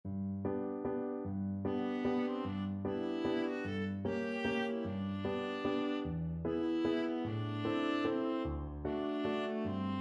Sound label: childrens album no8 waltz, viola